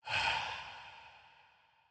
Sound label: soulspeed; minecraft; enchant